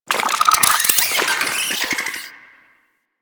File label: Infested Weakpoint Vocal Chatter; Warframe